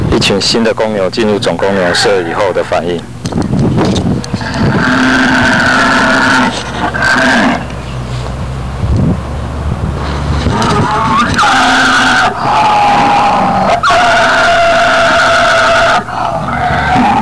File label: bellow, cow